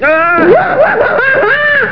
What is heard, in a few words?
laugh